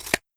Copy To Clipboard; fenrir; wav sound theme; soundicons

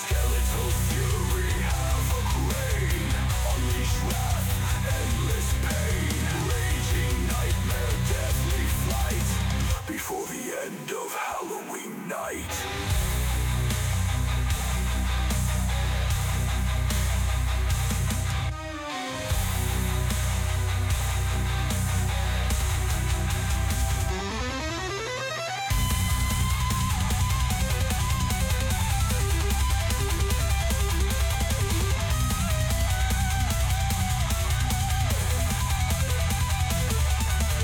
music menu; wicked-quest

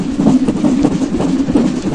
pumpkin high; wicked-quest

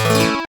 Braille On; fenrir

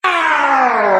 bandit dies; enemies; draugnorak

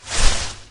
deep forest; terrain; draugnorak

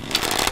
load; game; pybottle; python version of bottleblaster; cli-games

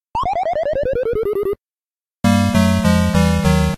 lose, duck hunt; game; bashit; cli-games

